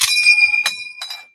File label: bing